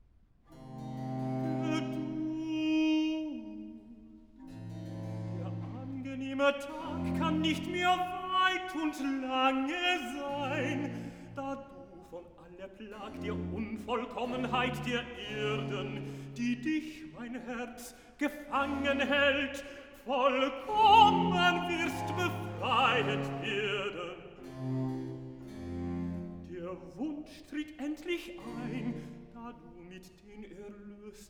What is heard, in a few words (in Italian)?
Recitativo (Tenor); Bach; London; Feast of St John; Cantata, BWV 30 'Freue dich, erlöste Schar'